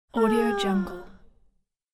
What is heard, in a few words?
female shy satisfied; آه کشیدن زن خجالتی; human sounds